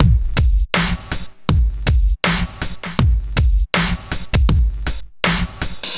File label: preach on, Drum loops Boombap